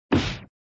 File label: Sound Slash, flipper; Slash v2